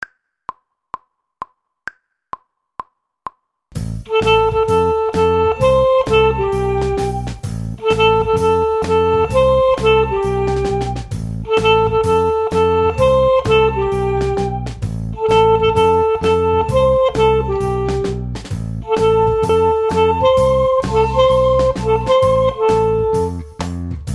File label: clarinet